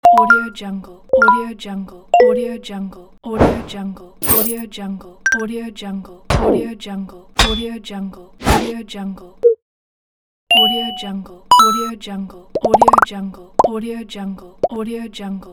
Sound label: infographics video sound effects big pack